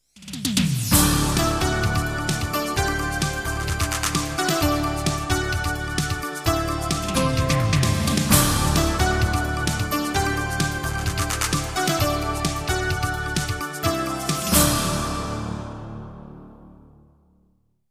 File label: Jingles; 90 DDD Jingles